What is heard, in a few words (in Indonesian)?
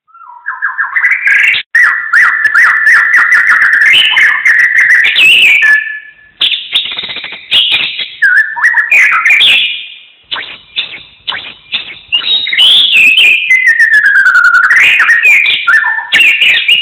suara master burung beo gacor